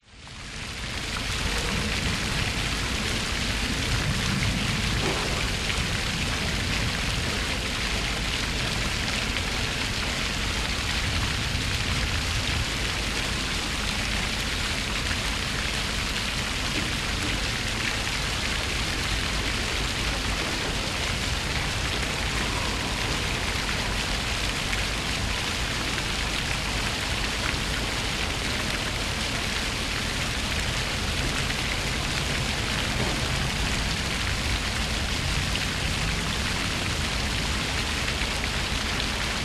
brisbane cbd botanic gardens by fountain people birds place construction work in; distance